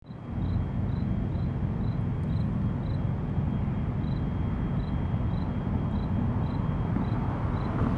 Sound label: a cricket chirping; crickets